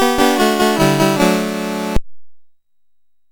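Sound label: Pancho, MOS 6581 SID chip music, games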